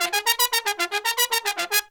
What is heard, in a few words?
Pop & Funk Brass, L; Best Service ProSamples vol.25; AKAI